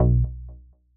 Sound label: Sample Bass B2